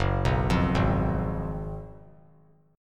F sharp Major 7th Suspended 2nd Suspended 4th; F#M7sus2sus4 arpeggiated

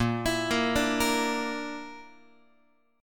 Bb Major Flat 5th Bb down Guitar, chord; arpeggiated; JGuitar

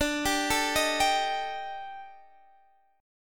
Eb Major 7th Flat 5th D, guitar

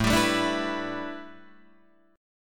A 7th Suspended 2nd Suspended 4th Guitar DADGAD

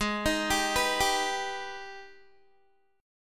Ab Minor Major 7th, down, Guitar; arpeggiated; AbmM7

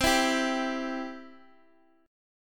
C Major, Guitar DADGAD

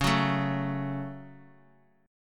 Db Suspended 4th Sharp 5th, Guitar, Standard; 9,9,7,x,x,x; strummed

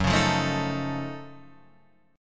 F sharp Minor Major 13th, Guitar, Standard; strummed

